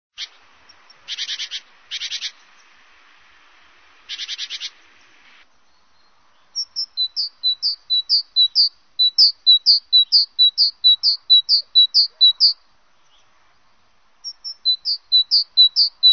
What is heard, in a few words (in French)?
Mesange charbonniere, sons nature